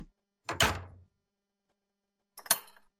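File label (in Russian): сундук закрывается на замок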